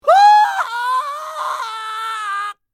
Pig fear; bad piggies; android; mobile; windows

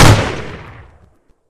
Revolver Fire

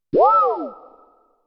Desktop (Mail Transmission); dot hack

